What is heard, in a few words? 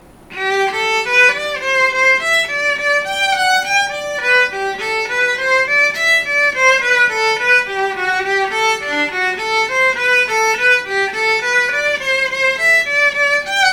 violin; jesu joy of mans desiring